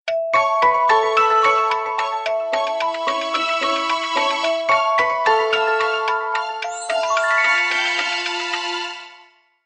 Bells Of Joy

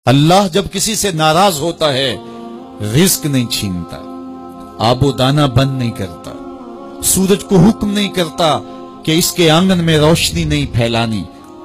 Islamic alarm

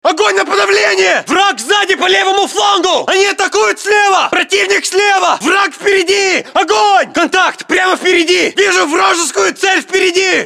Russian Ringtone

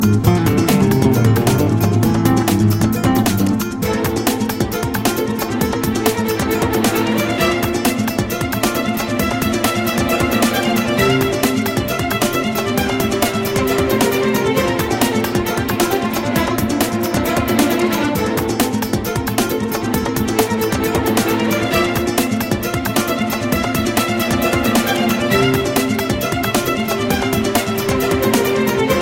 Intense; Arrancar

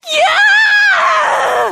Screaming

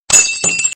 Glass

